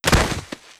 Flop

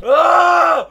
Aaagh; asdfmovie

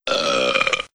Burp

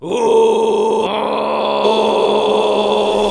Ooohhh; asdfmovie

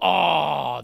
Aww; asdfmovie

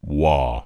Wah; asdfmovie

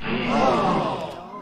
(Gasp)

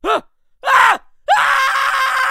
Scream of terror; asdfmovie

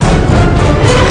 Dun dun dun dun; asdfmovie

